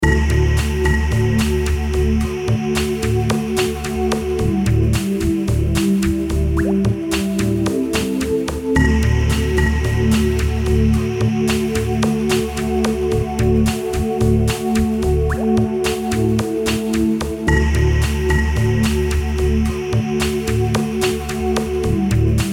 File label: GM loops